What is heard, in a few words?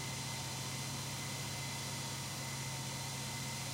t90a engine int rpm 33, tanks; War Thunder